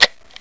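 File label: Olympus Pen FT, camera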